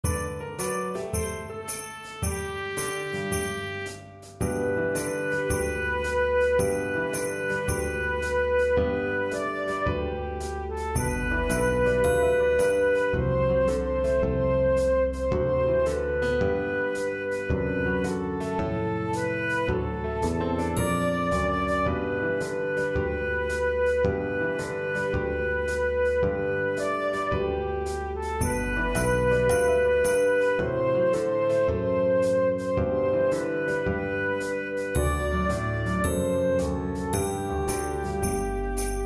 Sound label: Jingle Bells Flute Track with Melody